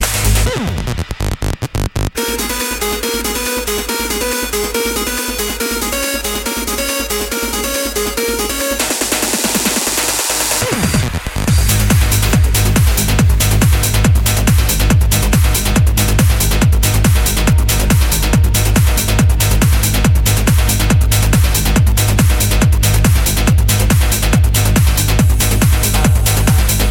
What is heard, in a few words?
Trance